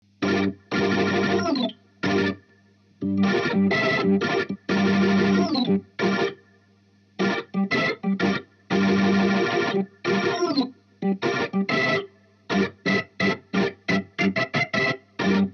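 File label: amp organ, Hybrid neural guitar overdrive; Morphdrive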